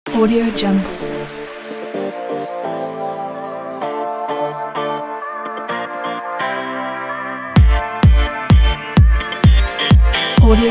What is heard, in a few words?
summer vigorous sport house